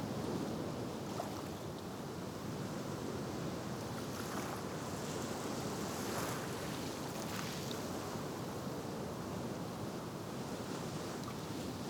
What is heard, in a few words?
ambience shore windy; Rust